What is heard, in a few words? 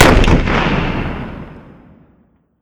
rocket fire, weapons rpg